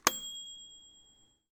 Ding

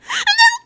Gasp Uhnu

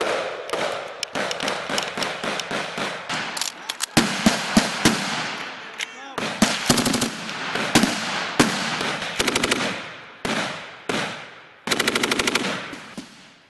Gunfire Battlefield Army Training Shooting

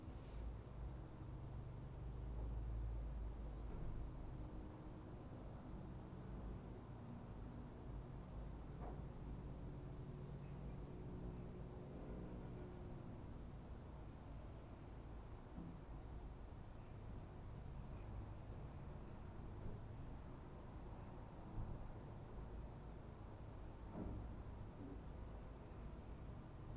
Roomtone apartment Day

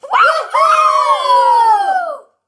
Funny Kids Juhu

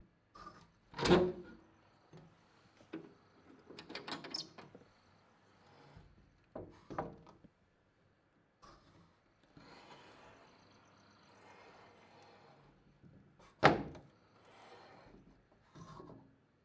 Window Opening

